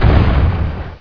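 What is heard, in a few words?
explosion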